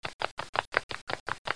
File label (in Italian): passi; Omnia Junior - Base Terra